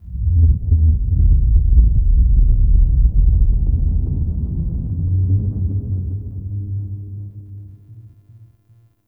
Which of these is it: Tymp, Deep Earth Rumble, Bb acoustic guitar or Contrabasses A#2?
Deep Earth Rumble